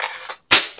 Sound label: Weapon Reload; VM Viper